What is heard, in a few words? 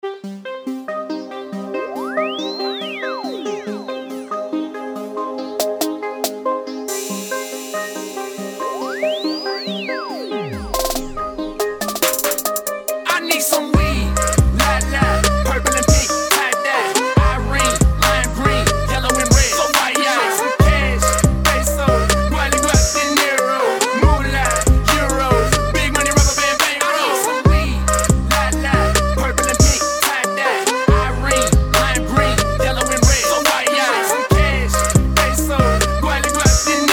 hiphop mix